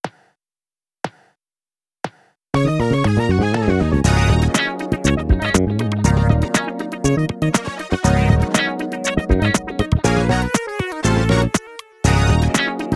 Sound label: drumless